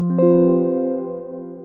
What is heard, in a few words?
windows 10 notify mail; build 10074